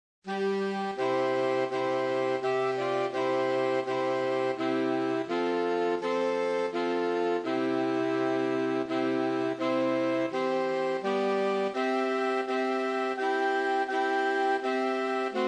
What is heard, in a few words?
away in a manger sax